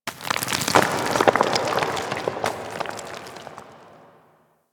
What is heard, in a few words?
Moon Dust Fall Medium, Ambience; Warframe; Orokin